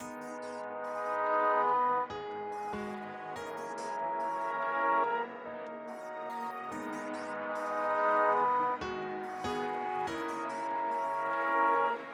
Melody; Reign

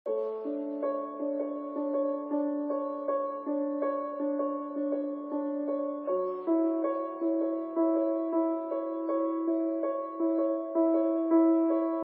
Lost 160 BPM

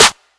Snare

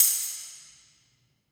PERC

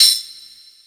tambourine verby

